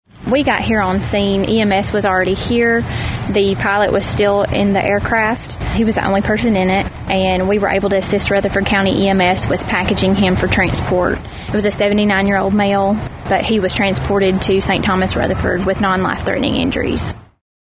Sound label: airplane